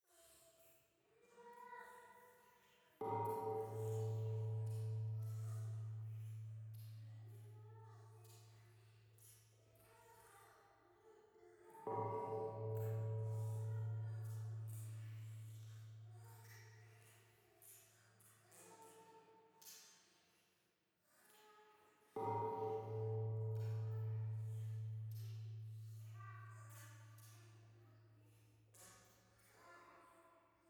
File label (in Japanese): 夢の中 イラマチオ 神社